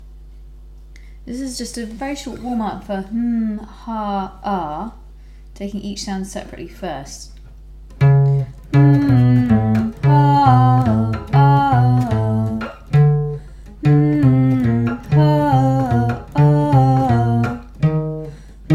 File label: Hmm Ha Ah